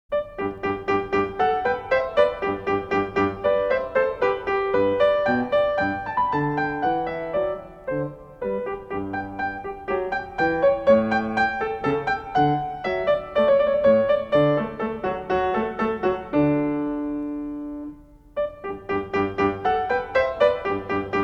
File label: March In G Major; Magrath Book 06